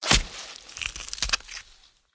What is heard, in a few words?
death stab; smite; Anhur